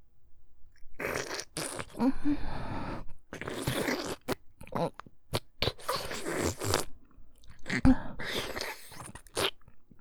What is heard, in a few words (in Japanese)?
フェラ音(喘ぎ声をあげながら)